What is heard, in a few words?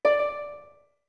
Harp D short; OOT